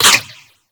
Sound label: Ricochet; Cowgirl